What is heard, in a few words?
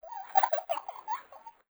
Baby Laughing; Kindergarten Online